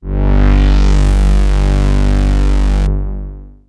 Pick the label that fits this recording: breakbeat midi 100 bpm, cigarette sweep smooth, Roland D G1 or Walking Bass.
Roland D G1